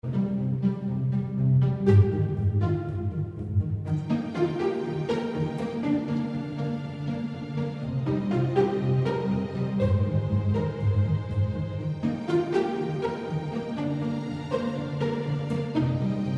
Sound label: Uplifting Strings